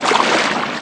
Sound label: Footstep water Heavy